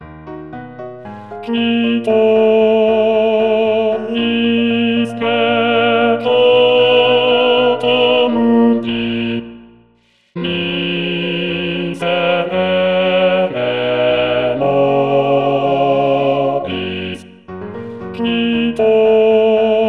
bass; gloria

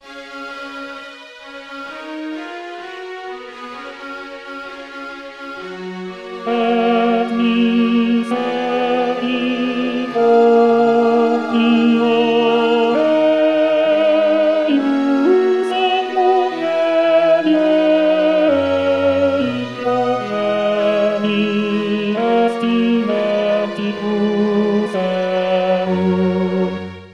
ten et misericor

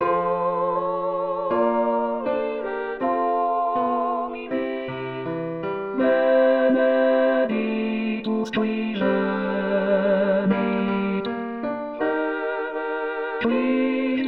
bass benedictus; messe breve aux chapelles gounod

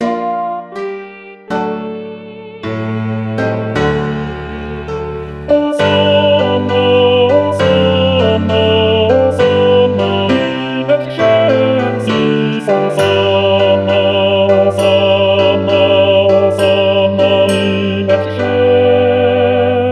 bass benedictus

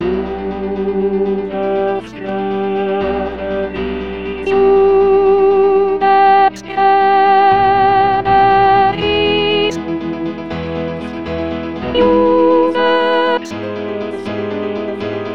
tu rex sop; te deum de georges bizet

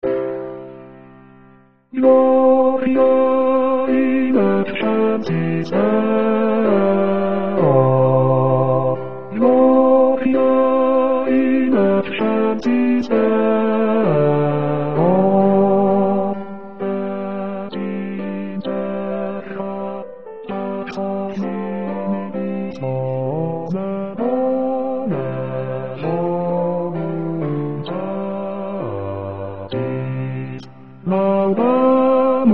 bass gloria

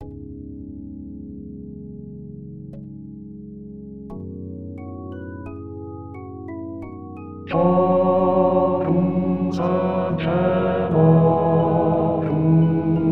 in paradisum bass

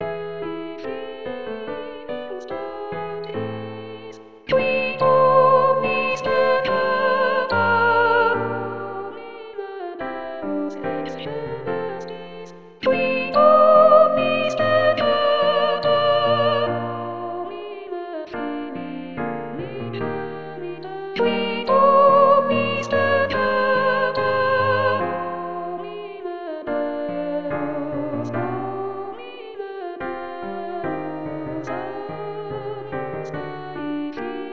sop domine deus agnus dei

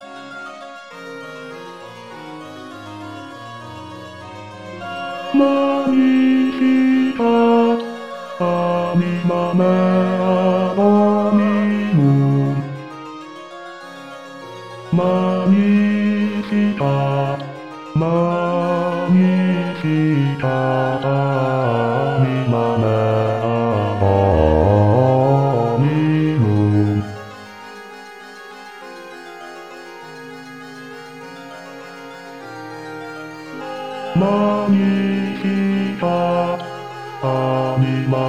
bass; bach magni

